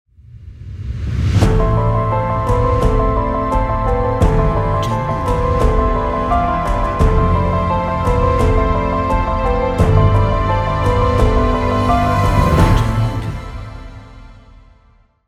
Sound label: Orchestral Trailer